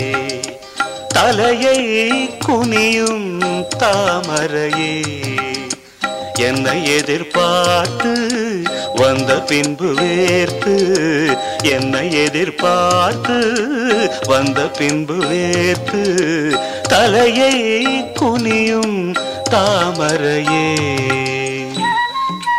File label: Tamil Old Songs